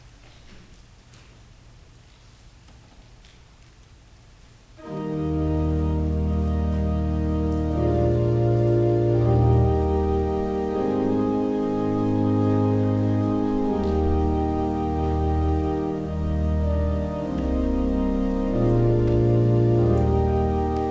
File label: Interlude; True Blessedness; Netherlands Reformed Congregation, St. Catharines, ON